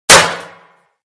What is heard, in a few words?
metal, bullet collide; Anomaly Bullet Cracks and Impacts Redone